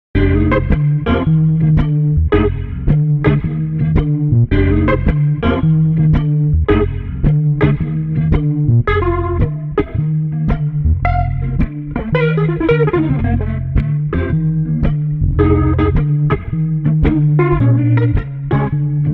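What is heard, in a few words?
Street Guitar Bass Funky Blues, 110 bpm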